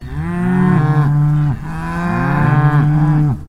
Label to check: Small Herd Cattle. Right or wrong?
Right